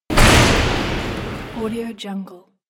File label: artillery to